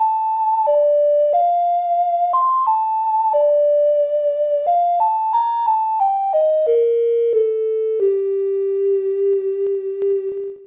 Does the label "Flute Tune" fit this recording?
Yes